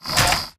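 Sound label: Stalker Movement Servo Damaged Walk; Titanfall 2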